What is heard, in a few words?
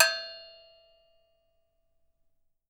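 deep cow, right, Percussion; Miroslav Vitous - Percussion & Harp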